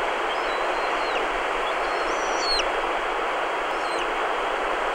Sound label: Herring Gull; BIRDS